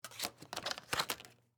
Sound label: Door Do Not Disturb, Foley, Door, Do, Not, Disturb, Sign, Hang, Hotel, Door; Miscellaneous Door; Blast Wave